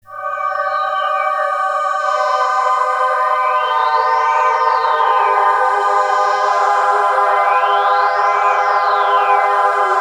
Pad; Polychrome

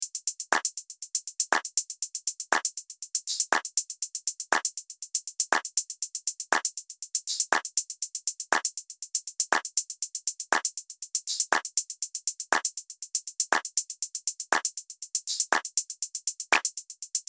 Drum Tops Perc; MobileMusic No1

